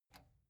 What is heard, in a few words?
metal button spring release